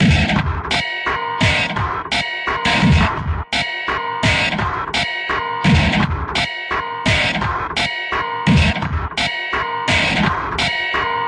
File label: 085 industrial trial, drum loops and breaks